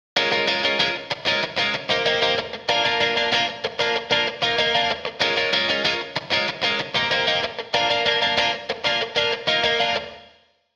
cabinet room; konvir lite; longtail audio

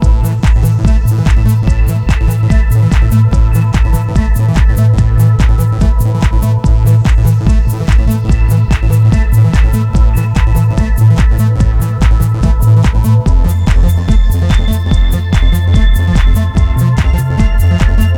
QY100 Trance; dance

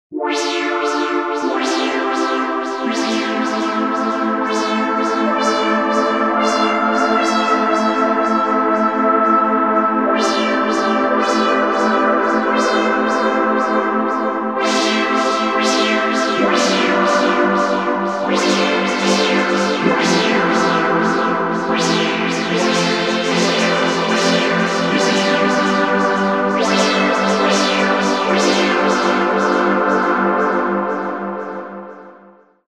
Reso Brass

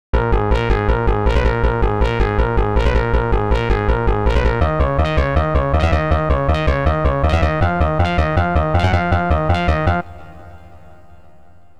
seq mono electro groove; the meditation soundset

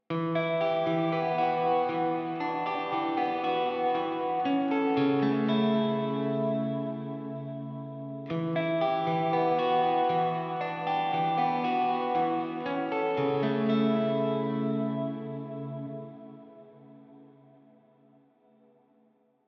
cathedral; konvir lite; longtail audio

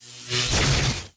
electric transport; electromagnetic fields; nova sound

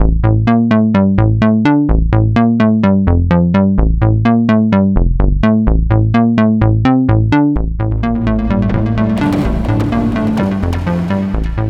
delay mod

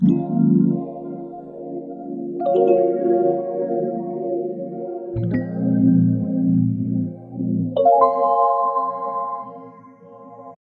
rhodes; wavefield

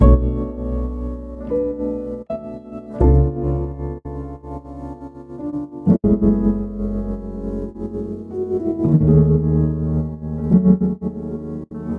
piano on; lofi drifter; yum audio

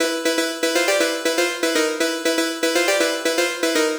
phonk; ultimate vital bundle; rocket powered sound

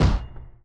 drum gun shot basketball bounc; cyber drums 2023; drum kit; nova sound

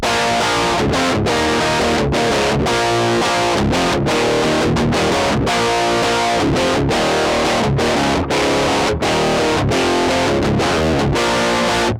punk style; tl distraptor; tonelib

